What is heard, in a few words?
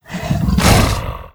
attack